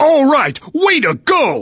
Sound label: Game Win Game; kids-tetris